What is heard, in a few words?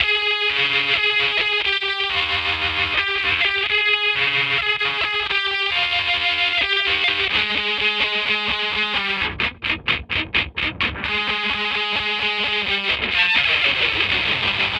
disto lfo mod